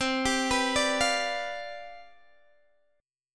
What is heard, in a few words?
C Major 7th Suspended 2nd Suspended 4th C down Guitar DADGAD, chord